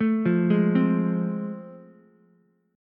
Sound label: A Minor Major 7th Sharp 5th, Ukulele; arpeggiated; AmM7#5; JGuitar